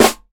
sparkle snare g key, Royality free snare sample tuned to the G note. Loudest frequency: 2568Hz